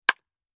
Baseball Bat; iMovie '08 Sound Effects

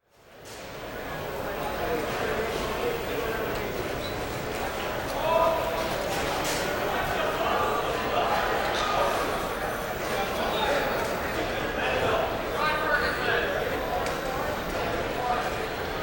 Busy Station; People

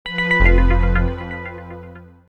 Mystery Accents; Textures

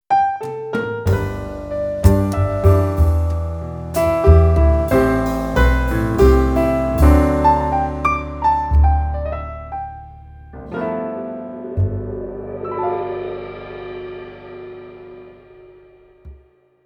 Curtain Call, Jingles; Medium; ilife

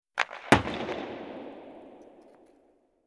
Hockey Shot; iMovie '08 Sound Effects

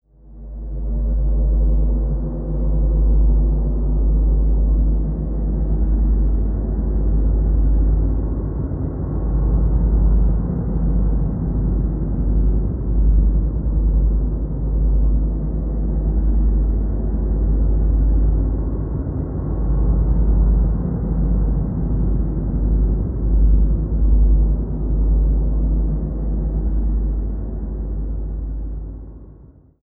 Drone Dark Suspense; iMovie '08 Sound Effects

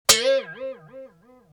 Cartoon String Break; Stingers

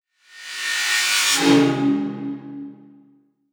Flange Sweep Hit; iMovie '08 Sound Effects

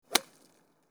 Golf Hit; iMovie '08 Sound Effects

